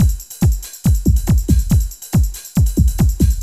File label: techno 140